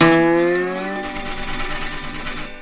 STARTUP

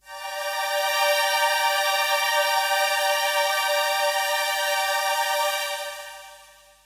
Pad E4, Techno Melody; Techno Music Maker

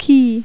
key; Die ASC Mega 2; ENGLISCH; SPEAKEN; Creative Voice

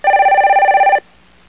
phone

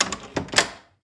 Door Click

